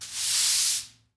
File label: SWEEP; mahlendur